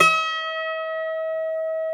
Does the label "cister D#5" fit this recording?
Yes